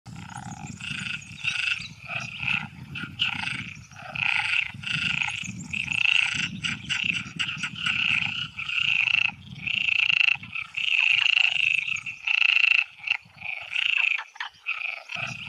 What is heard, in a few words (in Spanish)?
rana comun